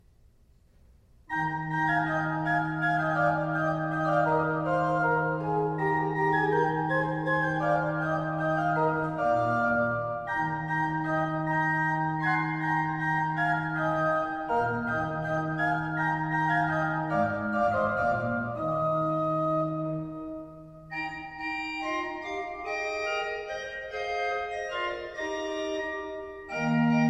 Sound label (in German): Orgel; Wir sagen Euch an